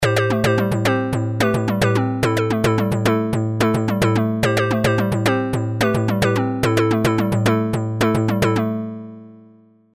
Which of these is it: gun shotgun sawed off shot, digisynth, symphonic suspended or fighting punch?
digisynth